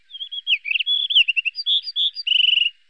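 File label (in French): merle bleu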